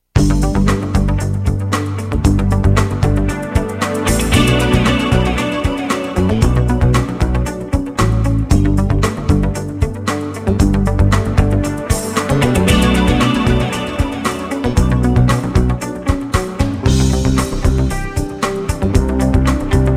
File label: Kissing Tower, pinball music; 3D Ultra Pinball Thrillride